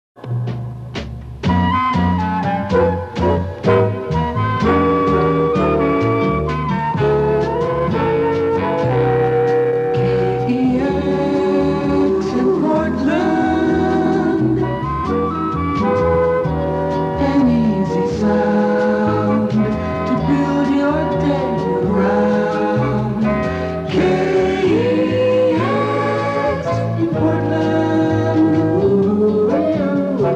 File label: Easy Sound; KEX